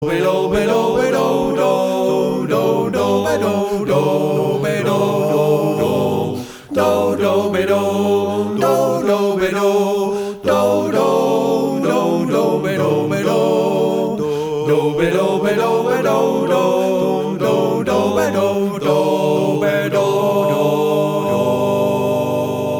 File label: Swinging Menuett